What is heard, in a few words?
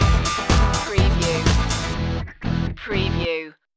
power agent stinger